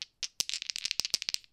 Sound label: die Shuffle, Casino; Kenney's Sound Pack